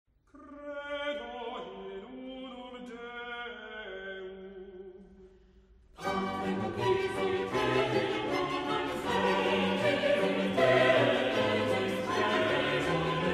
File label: missa rorate coeli desuper credo